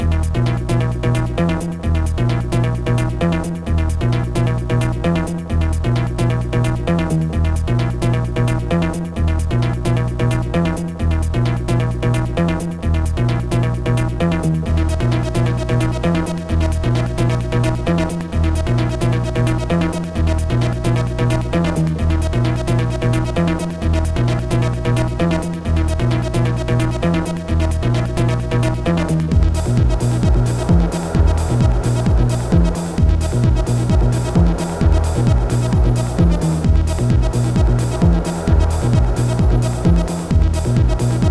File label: hiphop; Trackers; Modland; hiphop darlingmix